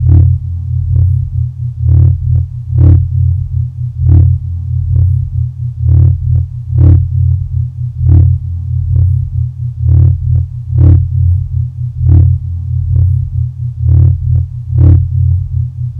Sub Vibrations; Sonic Foundry (Sony Creative Software); Loop Noir

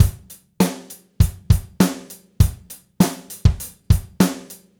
Gretsch groove 90 BPM, 1967 Gretsch Kit drums

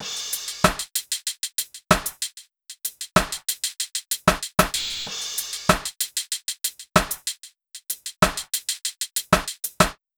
95 top loop, Breaks; PowerFX - Uptown Breaks

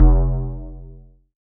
bass F1, Roland MKS-80

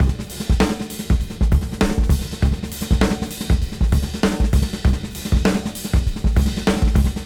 Swing 98 BPM; AMG; Keith LeBlanc Phat Butt Jams

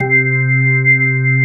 ORGAN, 135 BPM; Drum & Bass; Ueberschall; Drum & Bass Flash Trackz

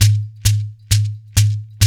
132 JU, African Contemporary Ju Ju; Spectrasonics; Supreme Beats

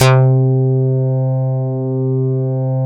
OBDX FM, SYNTH BASS; East Collexion; Bass S3000

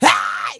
Haaay, Roland SPD-S

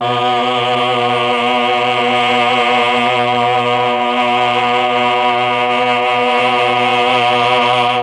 CHOR; ILIO; Propeller Island Legendary M400

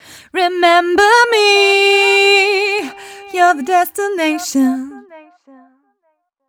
f mel c, fem Vocals

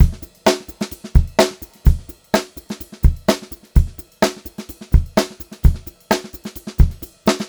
128 GR VAR, Funk Groove Drumloops; USB Soundscan vol.38; AKAI; 90 s Sample CDs; 128 GROOVY

